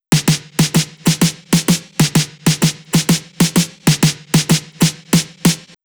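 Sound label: snare, Drum Loop 128BPM; SN Ad; VEC5 Drumloops; VEC5 Multi Loops; Loop Elements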